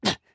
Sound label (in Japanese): 攻撃